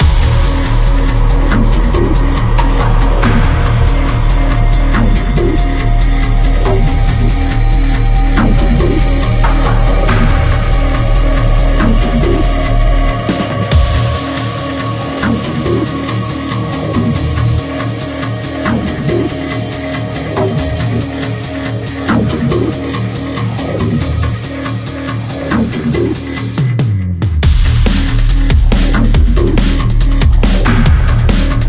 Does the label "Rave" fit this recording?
Yes